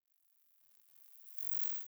Science Fiction Sci-Fi Electronic Static Wave Short